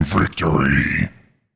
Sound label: victory; tf2